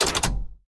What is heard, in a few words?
Item Machine Scroll; Santuario